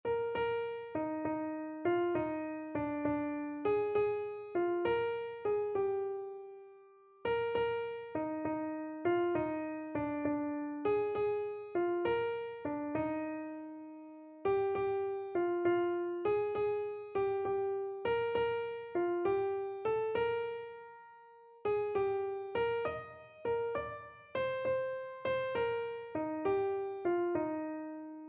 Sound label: faith is the victory, keyboard